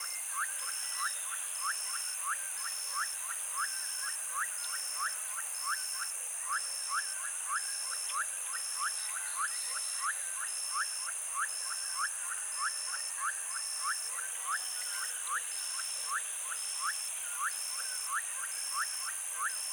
TROPICAL Mangrove at dusk mysterious grainy atmosphere; Articulated Sounds; Tropical Ambiences